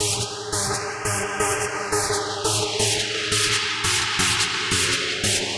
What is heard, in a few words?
Synth Hoovery Short 172 Ab